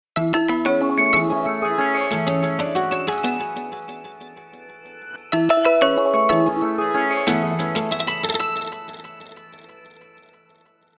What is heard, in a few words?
Elec Synth, ringtones; Vivo X80-Pro